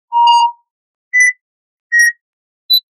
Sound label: Hospital Bleep